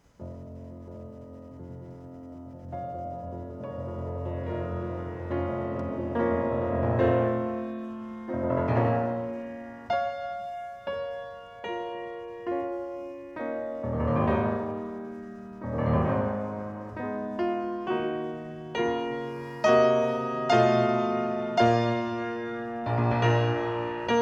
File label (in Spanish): Sonata para piano; Johannes Brahms; Nº3 en fa menor op.5; mov.IV